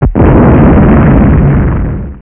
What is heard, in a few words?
explode